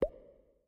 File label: reel bounce; reef raider